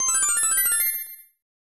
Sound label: Bell Chime; snes; Doraemon 2; Nobita no Toys Land Daibouken